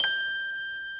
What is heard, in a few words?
ambient hat sound sample g key; tuned drums